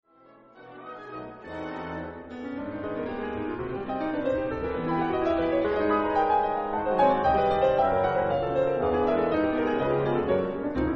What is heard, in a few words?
piano Toccata; Concertante